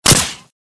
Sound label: Silenced, weapon